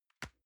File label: envelope drop soft